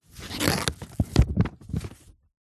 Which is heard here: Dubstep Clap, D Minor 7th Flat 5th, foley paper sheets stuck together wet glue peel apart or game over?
foley paper sheets stuck together wet glue peel apart